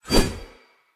Long Sword Whoosh; Weapons; Player; Swords; Pothia

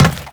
run metal, footsteps; Warframe; Teshin

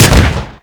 Fire 2D Shot, Weapons; Warframe; Boar

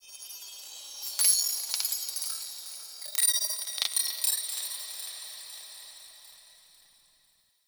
Glass Texture Small, Ambience; Warframe; Cephalon Weave

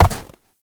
Step Snow; Movement; Uriel; Warframe

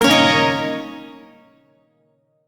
Shawzin Yo Chord K; Warframe; Duviri

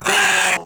Kavat Vocal Pain; Warframe; Khora

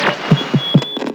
Radio Static Start; Night Wave

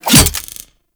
Throwing Taser Sticky Bomb Adhere; Tenno

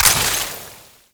Ammo Pickup Gas; Ammo Ability; Warframe; Warframes; Frumentarius

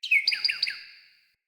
Cardinal Female Bird Summon Chirp

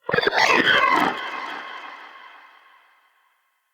Shrimp Vocal Death, Creatures; Vocals; Baliq Shrimp Glade

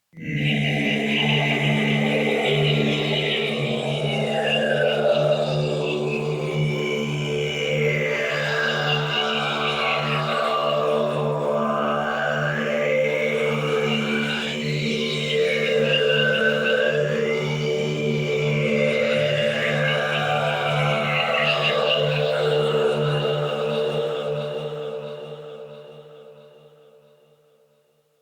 Choir; Warframe; Sentients

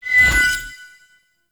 Archon Shard Ephemera Hide, UI

